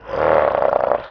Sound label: Warrior Growl; Playable Tutorial Level; TR2